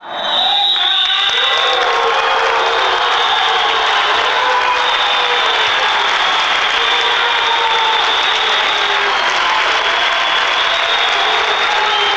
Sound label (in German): Heldenplatz Applaus Beginn; Burgtheater